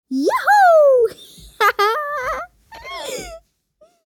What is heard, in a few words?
Little boy giggling